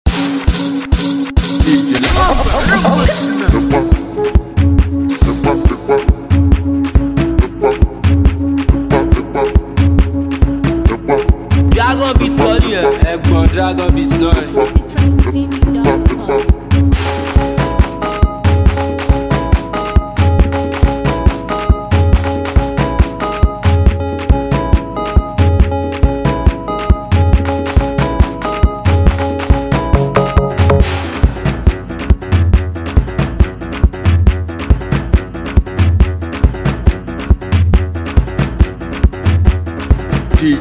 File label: Beat